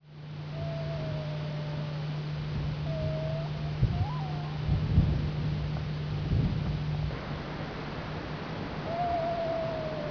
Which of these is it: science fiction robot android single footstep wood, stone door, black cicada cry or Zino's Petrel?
Zino's Petrel